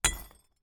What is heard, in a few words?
impact glass bottle drop onto smashed glass debris